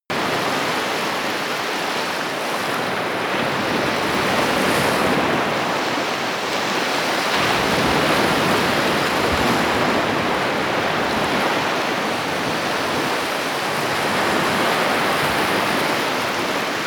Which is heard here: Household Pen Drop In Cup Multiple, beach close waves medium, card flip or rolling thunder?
beach close waves medium